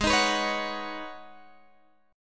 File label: G sharp Minor 11th G sharp Guitar Open G, chord